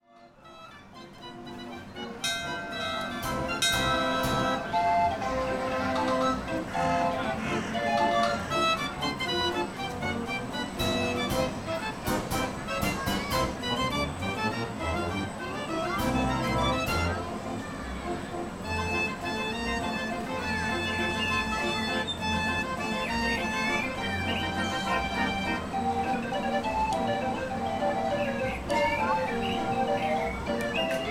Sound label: Barrel Organ Of Carousel